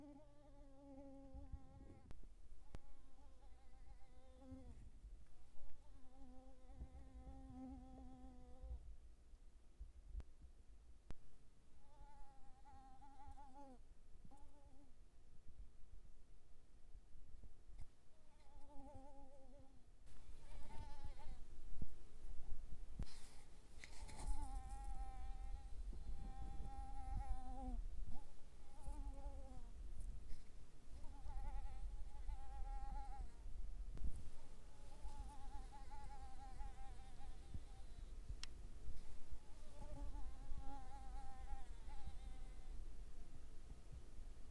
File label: Mosquito